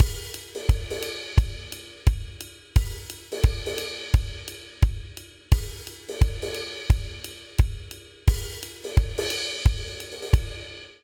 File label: Rolling Hip Hop Buildup 87 bpm, Drum; 4 4; MIDI Clips; Ableton; Factory Packs; Drum Booth